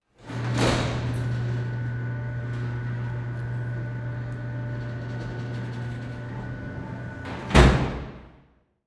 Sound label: Household Door Open Recreation Area